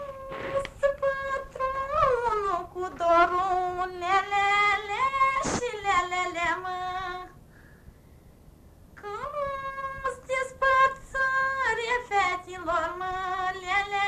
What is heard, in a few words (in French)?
Chant